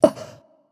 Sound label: surprised; smite; isis